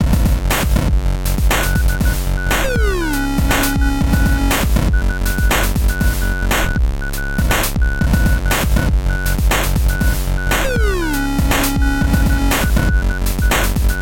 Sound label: beepsack; super time force ultra